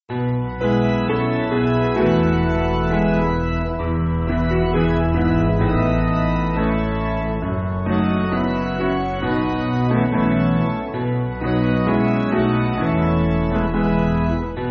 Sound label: Piano